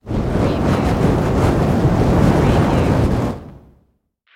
Fireball Fire Wave Short Burst

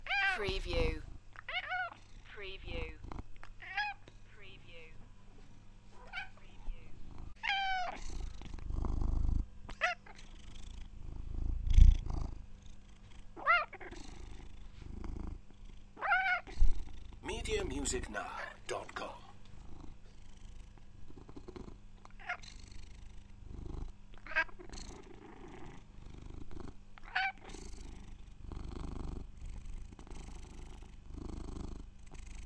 Cat meow and purr; beanstalk audio

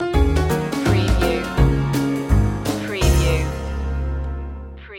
ragtime promenade

